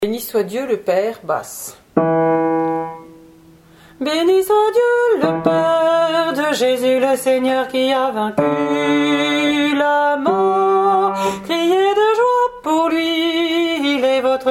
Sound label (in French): Béni soit Dieu le Père BASSE